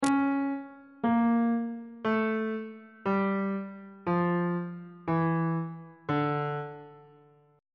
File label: c double sharp harmonic minor scale descending